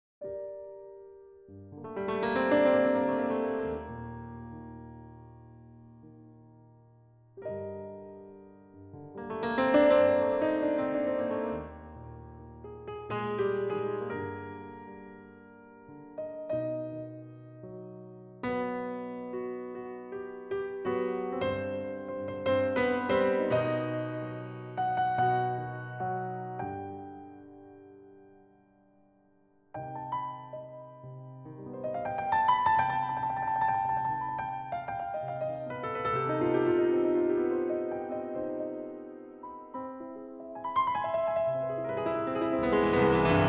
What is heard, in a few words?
Steinway Improv Ivory